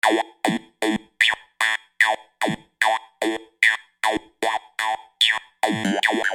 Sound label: Keyboard, Soul